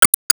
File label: Foot Man Carpet; disney star smash android ios mobile gamerip 2020